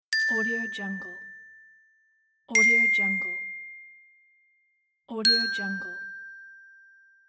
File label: ding